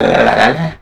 Errr, Vox FX; Total Drum&Bass

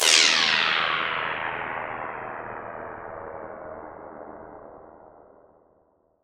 D&B Drop FX, Drum & Bass Kit; essential drumkit

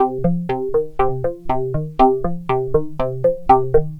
Bronco Synth; Synth SFX; Sonic Foundry (Sony Creative Software); The Electronic Music Manuscript Collection

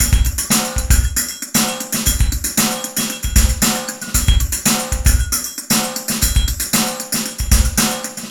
PHASE PHOL 116, LOOPS

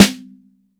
MIX SN, DRY STANDARD SNARE

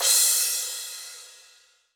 Cym Lo Crash; Alesis D4

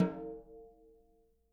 SN OFF, snares

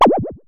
Synare Filter; Star Instruments Synare 3

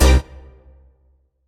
Dance Mega Synth; Best Service; 90 s Sample CDs

